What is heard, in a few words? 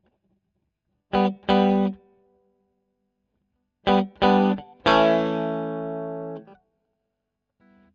E. GTR Royer